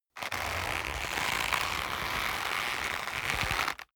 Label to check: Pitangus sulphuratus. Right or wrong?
Wrong